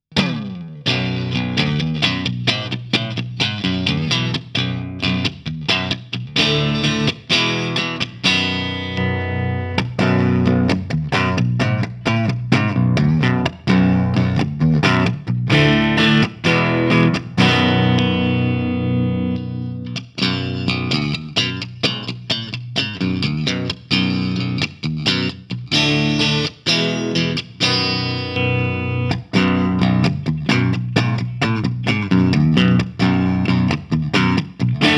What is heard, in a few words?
Chapman Guitars ML1 Modern Baritone Clean